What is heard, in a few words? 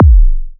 Goa Kick